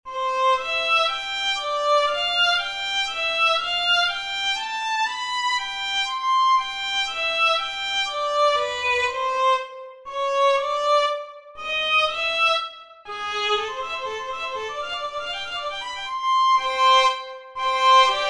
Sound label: violin solo